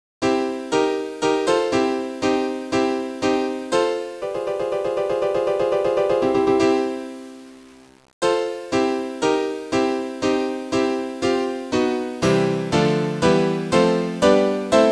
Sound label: chord; Cat333Pokémon